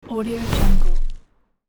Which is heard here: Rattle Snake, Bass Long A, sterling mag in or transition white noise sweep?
transition white noise sweep